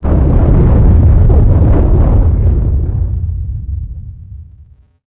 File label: explo Cruiser; impacts explos; republic